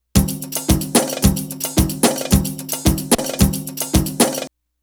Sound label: Arabic Rhythms